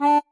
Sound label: marimba; ゆめ2っき